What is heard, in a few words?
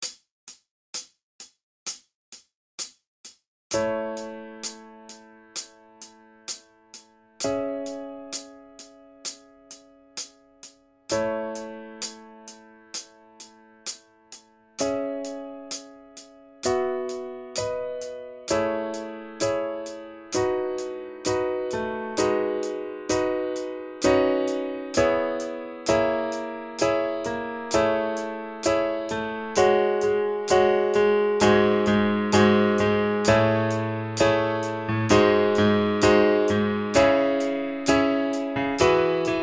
Piano click